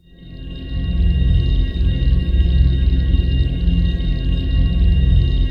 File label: ATM SYNTH; Best Service - XXL Production Effects